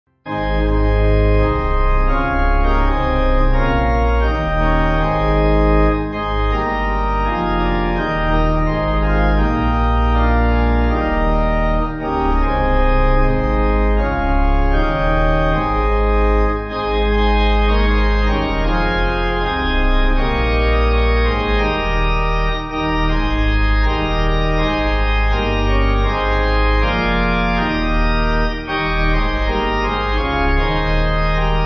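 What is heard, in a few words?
Pipe organ, church music backing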